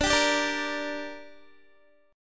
D Suspended 4th Sharp 5th, Guitar; strummed

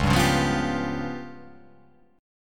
C sharp 7th Flat 5th, chord

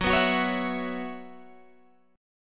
F sharp 7th Suspended 4th, Guitar, Open G

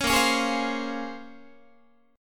Bb Minor 9th Guitar, chord; Bbm9; strummed; 16,18,16,18,16,16; JGuitar

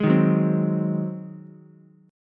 Ab 7th Ab down Ukulele; Ab7 strummed; JGuitar

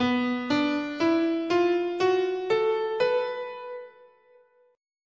B Blues

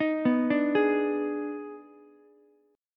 Eb Major 7th Suspended 4th Sharp 5th Eb, Ukulele; arpeggiated; EbM7sus4#5

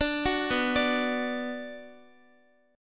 B Minor B Guitar; arpeggiated

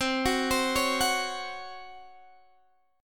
C sharp Major 7th Suspended 4th C

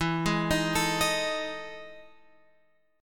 E Major 11th chord

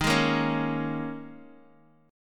D sharp 7th Suspended 4th, Guitar, DADGAD

